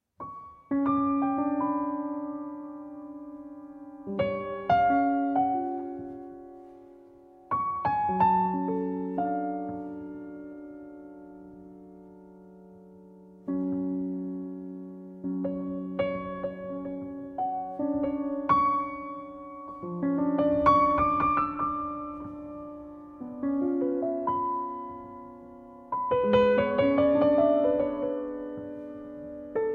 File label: Adagio Hammerklaviersonate